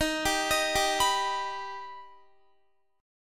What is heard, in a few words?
D sharp Augmented D sharp Guitar; D#+ arpeggiated; x,18,19,20,19,19